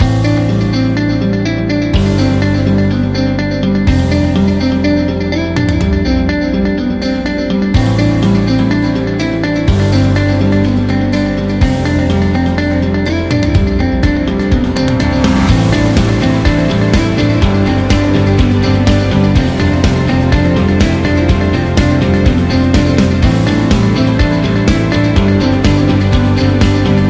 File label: Light Rock Loop